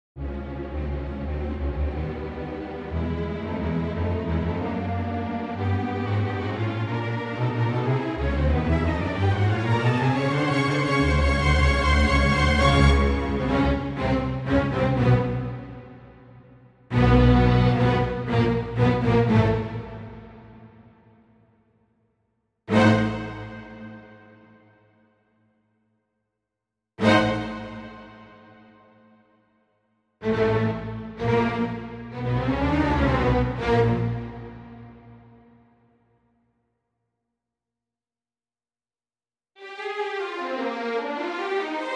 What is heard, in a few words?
Strings; Liszt